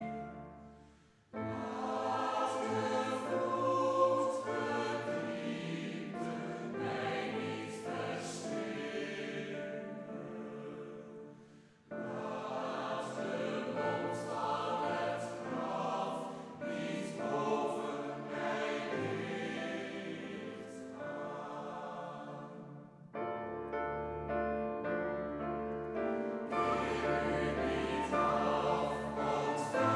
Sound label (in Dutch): Koor gemengd; Twents liturgiekoor